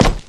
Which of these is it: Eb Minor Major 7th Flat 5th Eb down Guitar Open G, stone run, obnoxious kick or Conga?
stone run